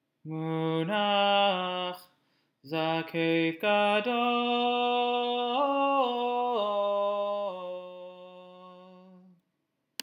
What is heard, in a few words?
Torah Trop Pattern